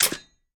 armor latch; smite; vulcan

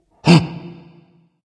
breath dragon; fafnir

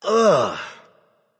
"ugh"; smite; merlin

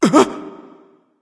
surprise; smite; zeus

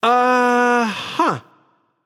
confused; smite; voicepack; osiris

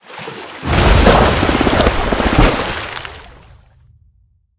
shark; pirate; smite; poseidon

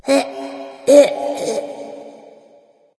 clear throat light; smite; voicepack; hel

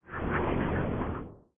float; smite; thanatos

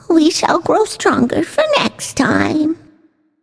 boo hoo; Smite; bastet

